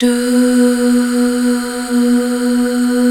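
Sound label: Duh Female; Best Service Gigapack 1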